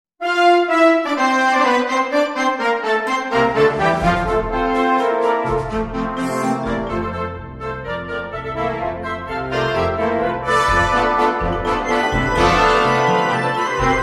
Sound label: Marcha do Pequeno Saltimbanco - Harmonie